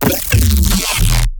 glitch stab; Virtual Riot; Glitch Stabs

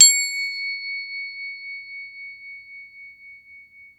CROTALE C, Crotales

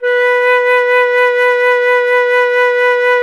FLT, WOODWINDS; Roland - LA Composer vol. 1

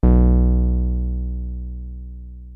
303 A2, TB 303; Hollywood Edge; Giorgio Moroder Rare Synthesizer Collection

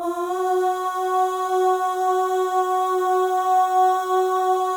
OH, FEM, Symphony of Voices; Spectrasonics; FEM.1 OHS

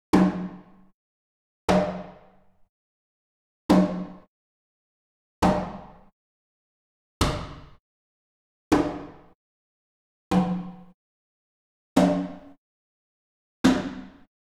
Gamelan; Propeller Island - Complete Gamelan

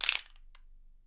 RATTLE; Miroslav Vitous - Percussion & Harp

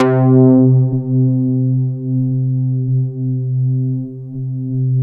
MATRIX 12, OB Synths; E-MU Formula 4000 Series Vol. 3; Analog Odyssey

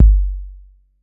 BD 808

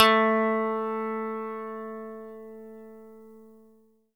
E-GITARRE, Alpine Volksmusik; Best Service

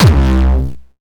Jump kick, Jump hardstyle kick

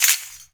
RAKATAK, Heart of Africa; Spectrasonics

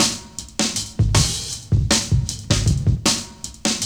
GRV, Guit It On

